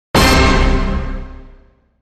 Hit Orch Lonely Heart C

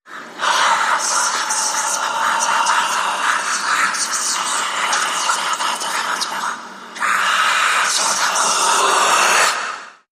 Vengeful Whispers Gargoyles

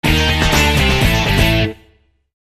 Rumble Sticks, Sting

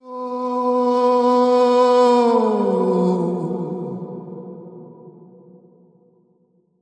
Heavenly Voices Male Bend

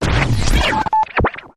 Quick Jumble Beep

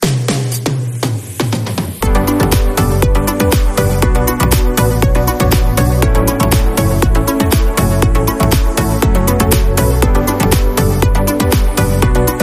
Summer Chill House

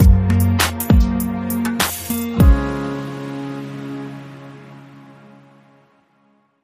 Bumper

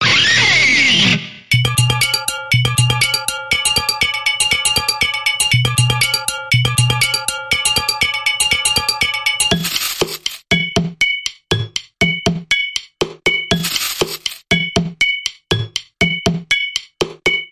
Jarred Percussion Bumper

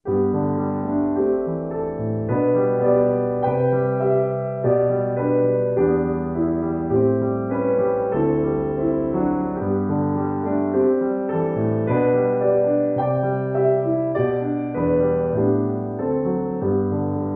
Solo Piano

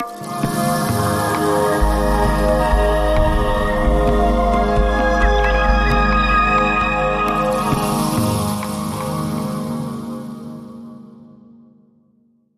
Sonic World Logo

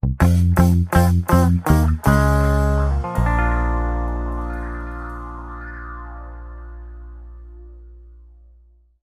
Ska, Sting

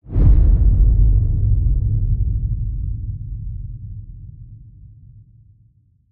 Faint Deep Whoosh Boom

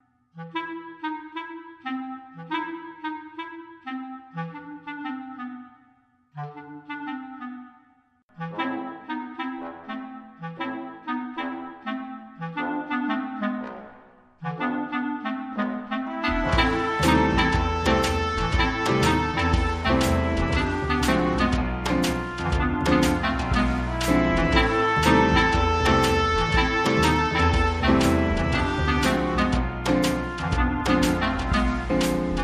Blues Corse

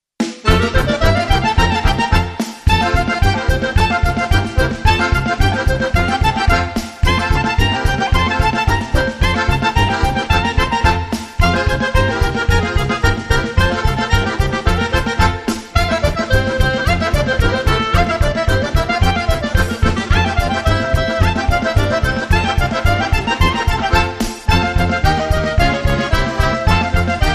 Happy Circus, Fast Version

